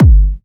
Techno, Kick; Techno Sounds 2024

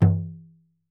Frame Drum - C; percussion; Cymatics - Infinity Beta Pack 2.0; Zeus Percussion Collection

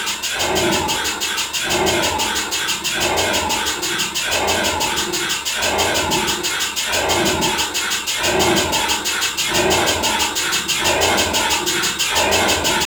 funny machine fx; Cartoon - film effects

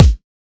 Dubstep Kick; Ghosthack; Dubstep Sound Arsenal

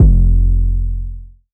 808 F; Cymatics; Oracle Sample Pack; 808s

